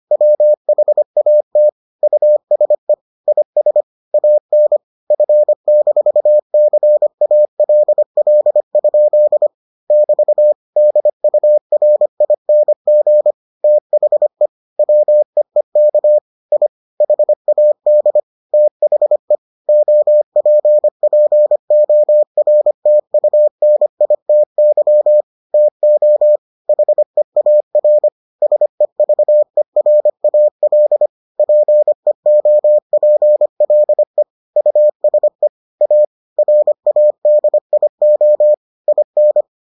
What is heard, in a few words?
morse